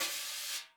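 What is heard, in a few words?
brush single; Northstar - New Gold VOL-2; 50S BRUSH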